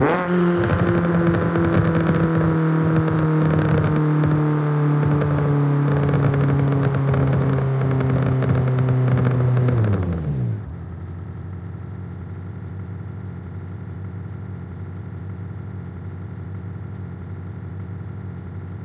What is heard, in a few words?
throttle off, vehicles